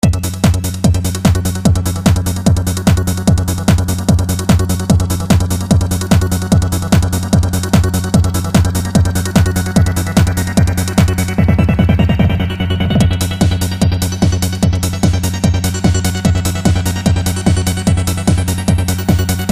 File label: electron trance